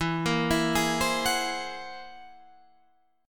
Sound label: F sharp 9th Flat 5th E, chord